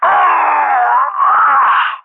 vo player ooooaaaa; allegiance

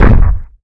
hit rock; allegiance

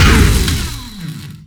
turret gun; allegiance

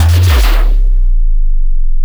hit me shield; allegiance

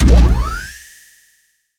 powerup development; allegiance

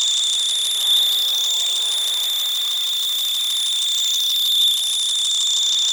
healing weapon; allegiance

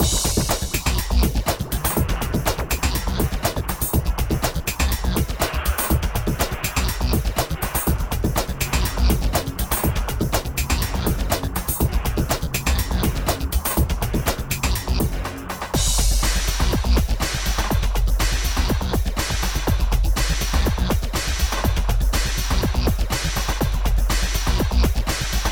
techno; allegiance; redbook

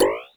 out of missiles; allegiance

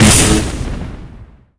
shield hit; allegiance

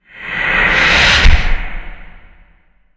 lrg slide out; allegiance

